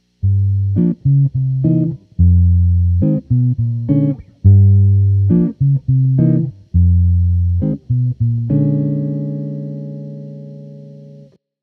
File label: dark